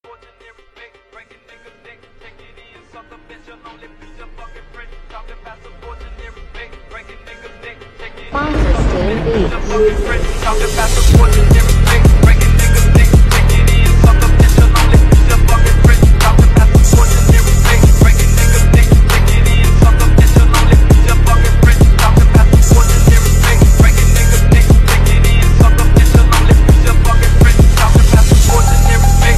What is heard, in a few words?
Phonk